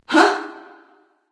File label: surprise; smite; nox